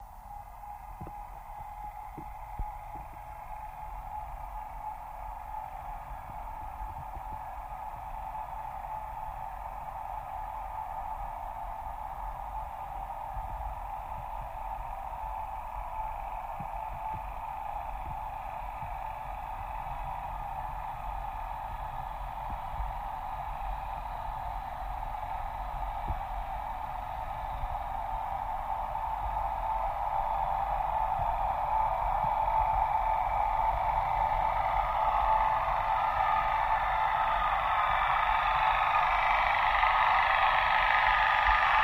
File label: Torpedo Jet By, torpedos